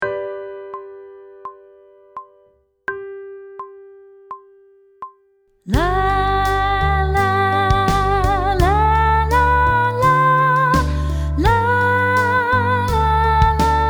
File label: Sight Singing with vocal, pop vocals; Grade 1